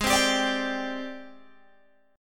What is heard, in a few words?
G sharp Major 7th Flat 5th, Guitar, Open G; 18,17,17,19,20,17